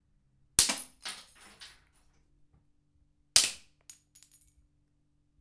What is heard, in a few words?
Snapping Ruler